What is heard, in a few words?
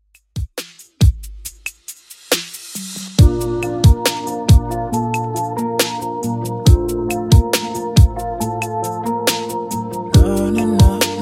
Top40 Redrum 69bpm